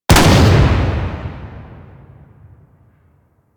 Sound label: Gunblade Shot, Weapons